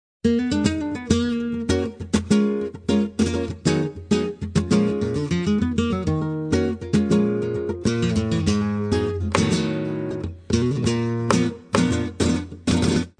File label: spanish guitar